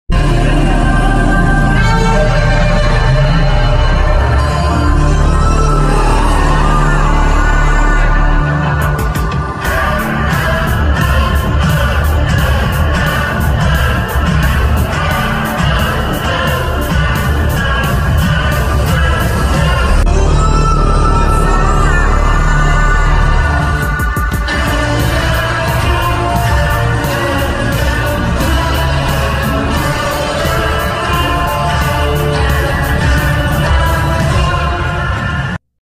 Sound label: villain bgm; ajayante randam moshanam; tovino thomas